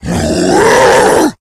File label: rage, infected, male